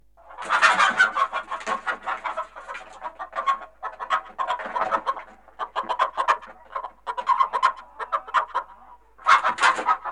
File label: domestic chicken call